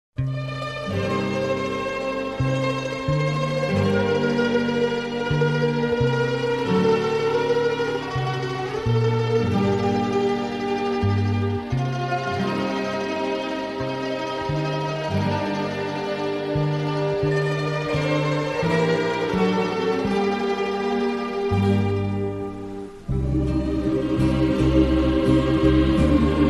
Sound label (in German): Zwei Gitarren